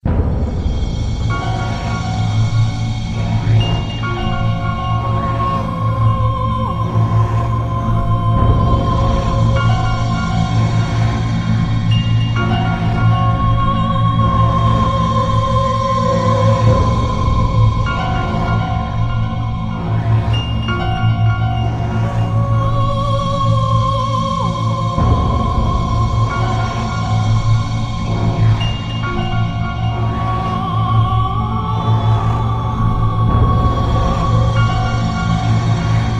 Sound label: BGM; Adventure Player; psp